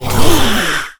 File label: NPC Killed